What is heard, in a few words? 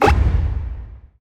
countdown one; fallguys